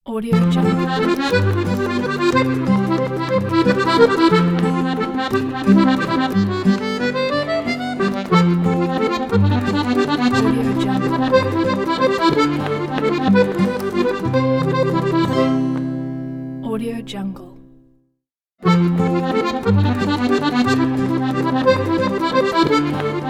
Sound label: french ident